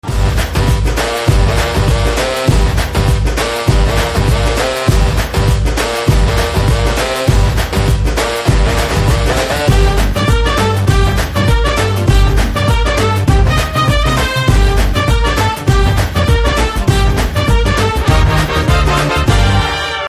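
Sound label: background music ringtone; Alludu Adhurs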